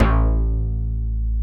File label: SLOPPY BASS